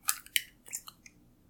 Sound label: crack egg; cooking